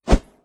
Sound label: organic whoosh